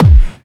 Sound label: Bassdrums Clubby Kicks; Vengeance Samples